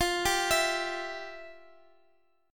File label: F Major 7th Suspended 2nd F, Guitar Open F; FM7sus2 arpeggiated; JGuitar